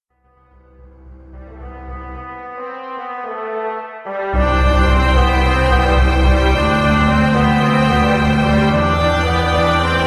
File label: orch; msx